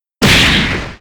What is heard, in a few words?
bullet elbow slam